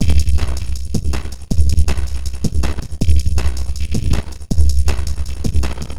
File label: Noise Beat; Drums & Drones